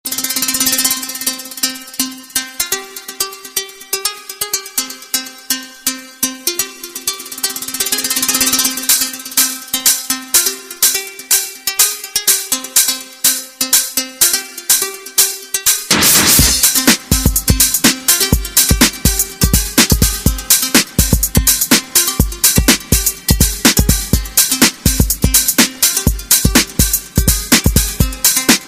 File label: Arabic Beats Ringtone; ringtones